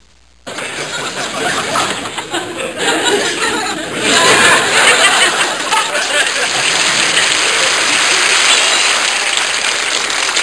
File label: Laughs to Reaction, Applause; Human; Soundsation